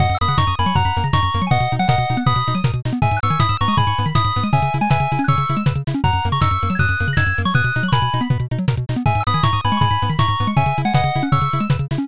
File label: bgm drive